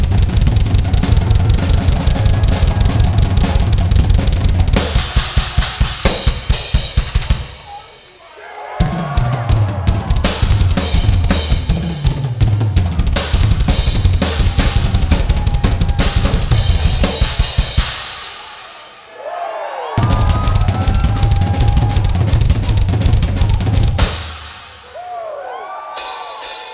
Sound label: Drum Solo